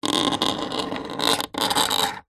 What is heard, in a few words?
human fingernails scratch rub on wood; zapsplat